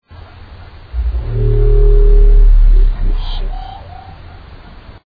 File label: Bio Twang Example